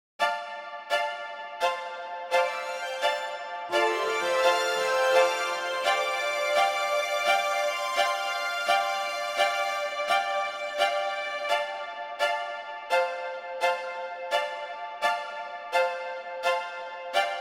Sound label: Strings; The Great Pyramid